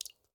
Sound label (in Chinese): 滴水石锥水滴落声